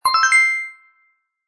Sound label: end score; jetpackLearningPlatform; coyote-bridge-crossing